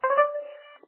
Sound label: Warning